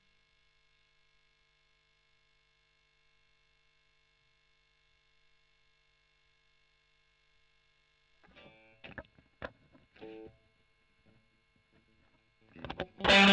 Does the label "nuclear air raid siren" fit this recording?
No